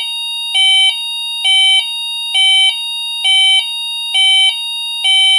US HiLo; Tonfolgen Hella; Dachbalkensystem RTK 7 Konfigurator

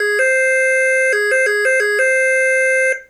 Austria Police; Tonfolgen Hella; Dachbalkensystem RTK 7 Konfigurator

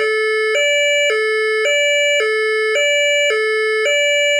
France Police; Tonfolgen Hella; Dachbalkensystem RTK 7 Konfigurator

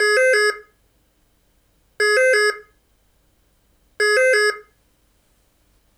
France Ambulance; Tonfolgen Hella; Dachbalkensystem RTK 7 Konfigurator

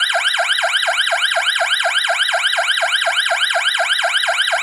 US Yelp; Tonfolgen Hella; Dachbalkensystem RTK 7 Konfigurator

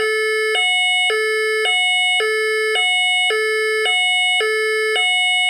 France Gendarmerie; Tonfolgen Hella; Dachbalkensystem RTK 7 Konfigurator

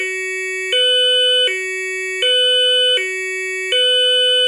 Netherland Twosound Police; Tonfolgen Hella; Dachbalkensystem RTK 7 Konfigurator

